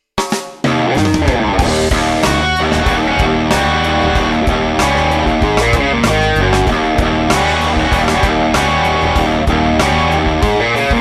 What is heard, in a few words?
rockout, guitar